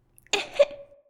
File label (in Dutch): hoest